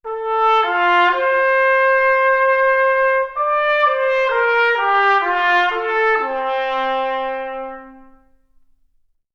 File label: trumpet